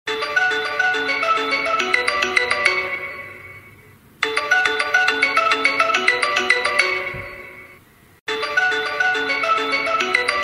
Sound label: Default ringtone